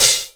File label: quiet hi hat one shot A key; Duration 0:01; Bitdepth 24